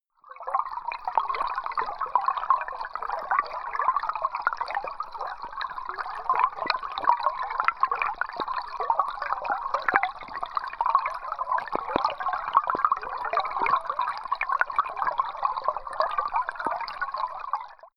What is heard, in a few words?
stream